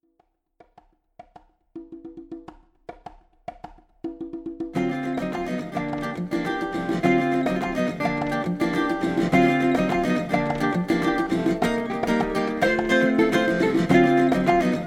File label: My Samba